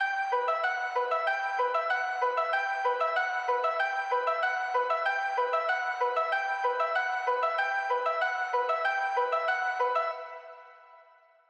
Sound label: 95 BPM E Min Keys, Hip Hop; Melody Loops; Cymatics; Hip Hop Sample Pack; Perro